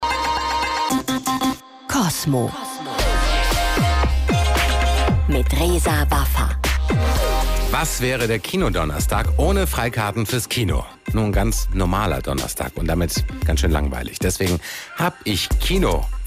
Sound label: Opener